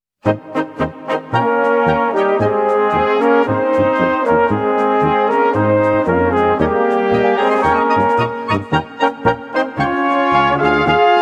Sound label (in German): Blasmusik